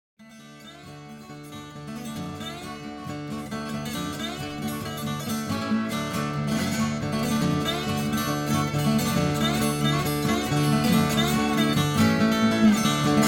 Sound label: Steel Guitar; Acoustic Guitar Summit